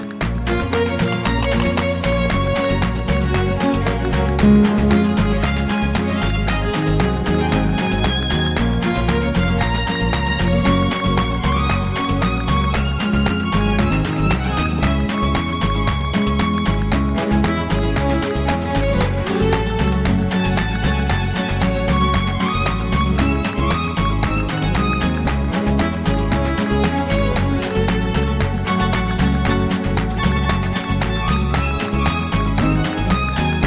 Gypsy Dance

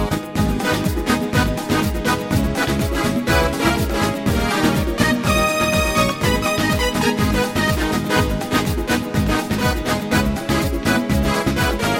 Spanish Remix

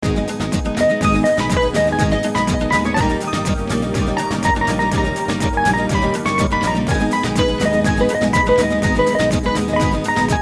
Spanish Dance

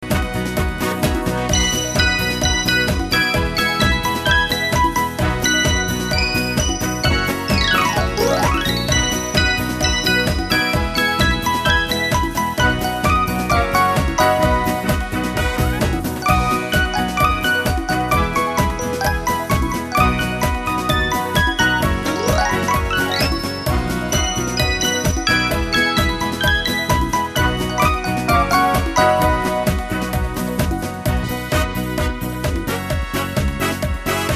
Hot Cha Cha

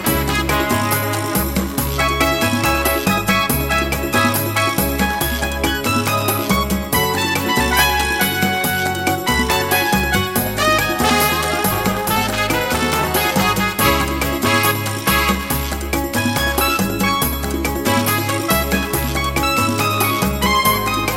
Cuban Cha Cha

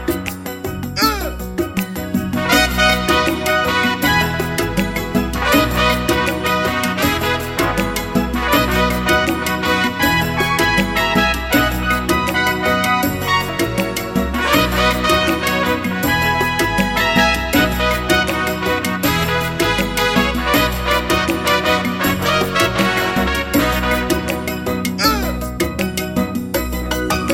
Calypso

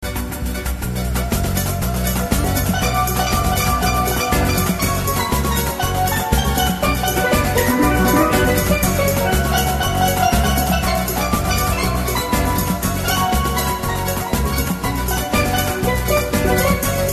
Samba Enredo

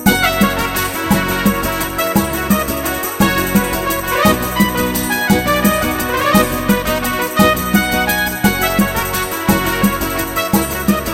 Latin 3-4

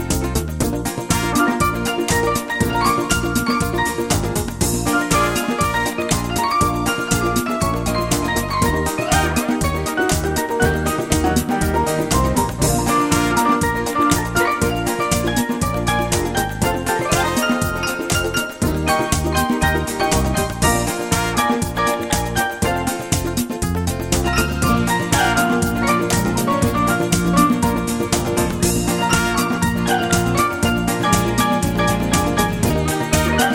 Club Latin

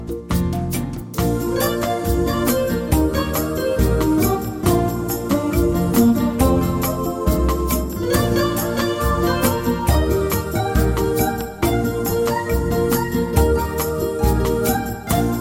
Bossa Nova for Lovers

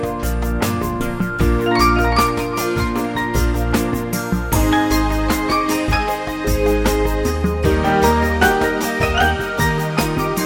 Analog Ballad

